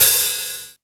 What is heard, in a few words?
HH SET